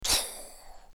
Shot; Assemblee SFX

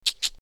Reload; Assemblee SFX

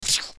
Shot; Assemblee SFX

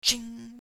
Ching; Assemblee SFX

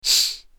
Sniff; Assemblee SFX

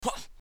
Hyah; Assemblee SFX

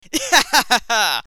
laughter; Assemblee SFX

